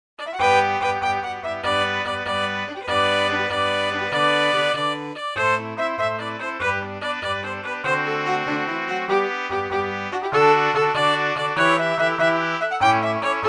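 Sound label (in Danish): sekstur MIDI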